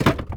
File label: window bars wood deploy; Rust